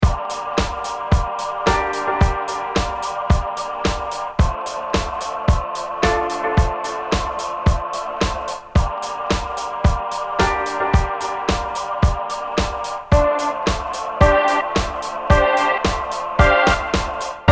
Orchestronic; gforce software

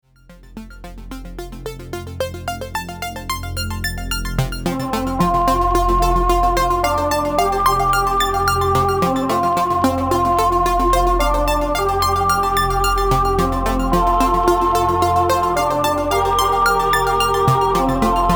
Europe Endless Choir; ORCHESTRON; gforce software

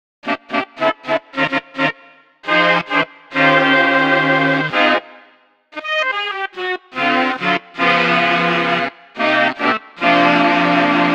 Tenor 2 Alto Sax; gforce software

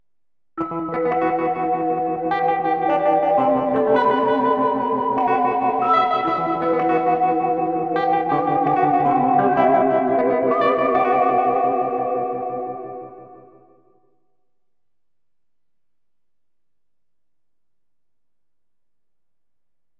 60s SCI FI GUitar and vibes

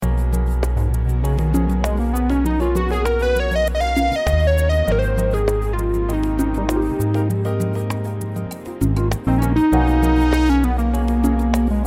Square Solo; Minimonsta; gforce software

